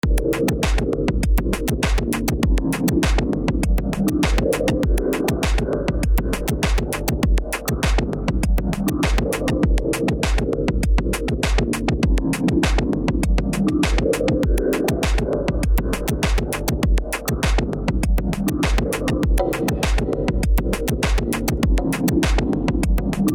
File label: untitled trance house thing